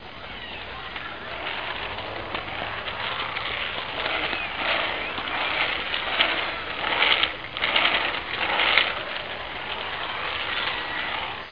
Skates

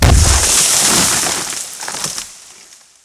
Crushing Tree; 1944 Battle Of The Bulge

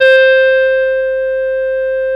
CLARIN

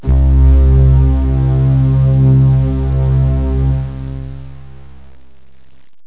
orchestral; OctaMED SoundStudio V1; jazzies samples

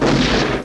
Gun Shot; CHECKERMANIA 1.04

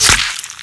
weapon impact water; Spearhead Demo; MacFormat 2003 June

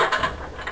BALL UP; RenderOrgan

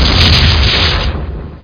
Bazooka; quake

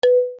balafon si; MOBICLIC 77; RATOCLIC

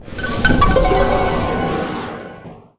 shrine; Heretic II; items

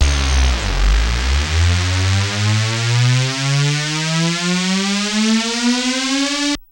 big rize, synths dance rap

